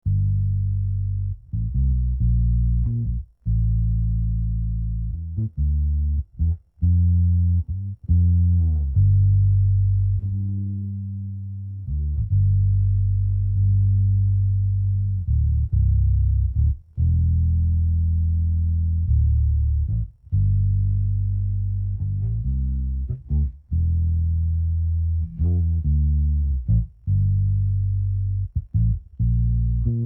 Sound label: bass no vocals